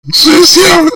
scream, zombie screams

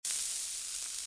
fire; grubs

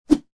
shovel swing; blocks and bullets

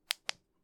click, pager; micromayhem

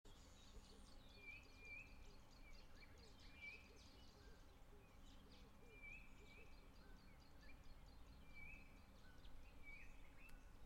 ambience, grass terrain procedural

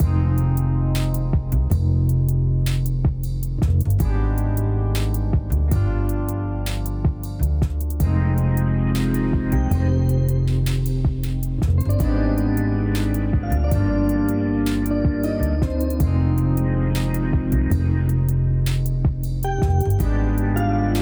stream loops; tallbeard; terrytarget

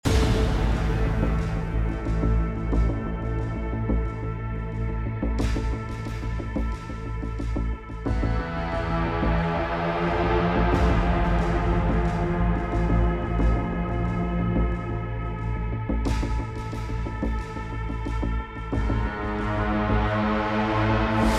music full left

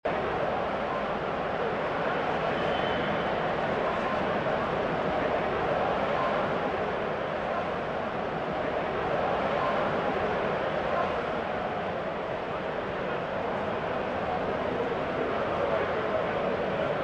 crowd ambience; punt

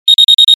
mine detonate; weapons; grubs

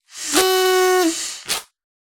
party blower; taunt; terrytarget

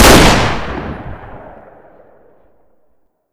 deagle fire; pistols; weapons; swb

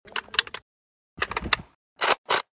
ak reload; outbreaksurvival

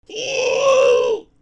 scream, zombie screams

